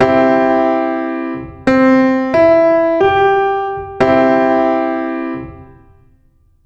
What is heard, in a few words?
C Major